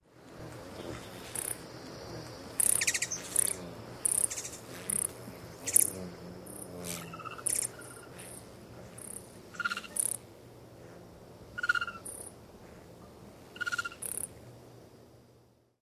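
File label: swing dance song, Ruby-throated Hummingbird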